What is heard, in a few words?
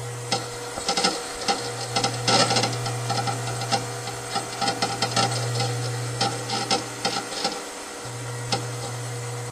harddisk writing